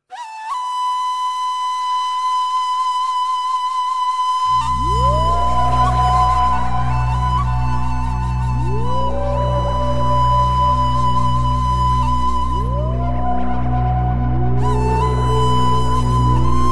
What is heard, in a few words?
Ney Sound